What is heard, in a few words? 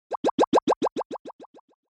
Accent